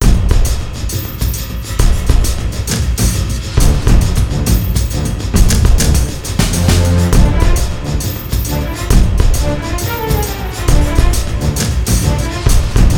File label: board & capture, music; Pirates Of The Caribbean